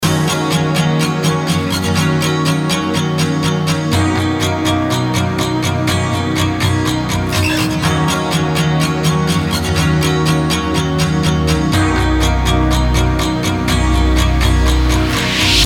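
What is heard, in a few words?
gitara gitar, guitar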